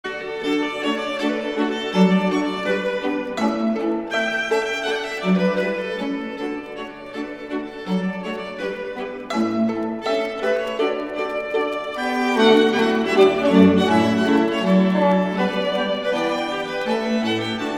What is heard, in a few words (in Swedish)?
schottis